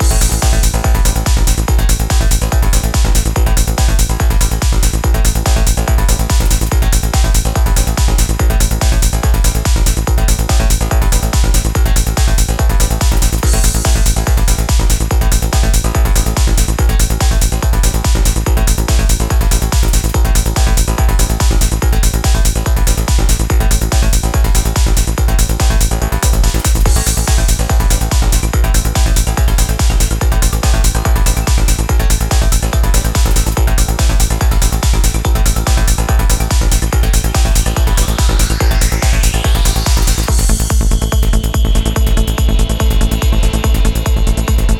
workout trance 143 bpm cardio power running fitness